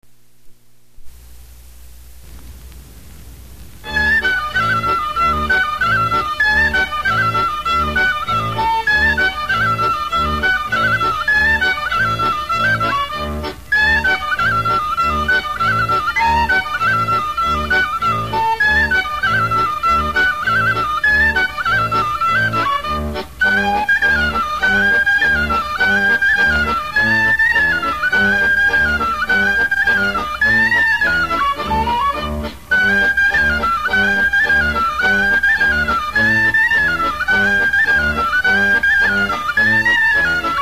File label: Romania Itele, Folk Dances; Petaluma; 1st String Folk Dances; Romanian